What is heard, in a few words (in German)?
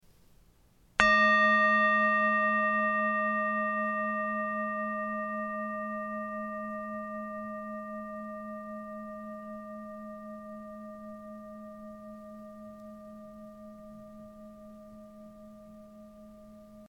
Klangschale